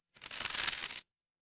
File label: paper fabric crumple